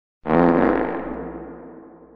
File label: farty with reverb